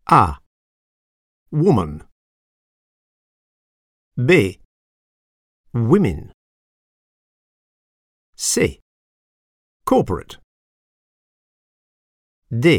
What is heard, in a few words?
techno